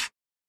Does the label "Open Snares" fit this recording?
Yes